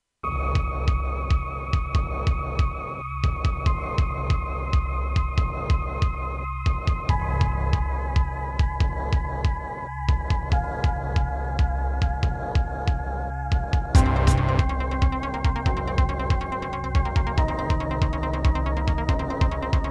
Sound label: Gravis UltraSound Extreme; sigil doom episode 5